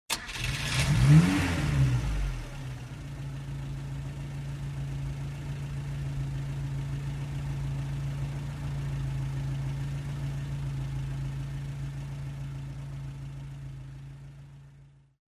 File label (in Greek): Mesa sygoinwnias Ekkinhsh Autokinhto